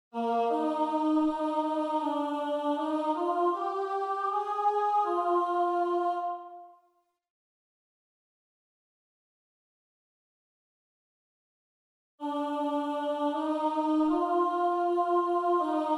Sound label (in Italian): beati i poveri in spirito contralti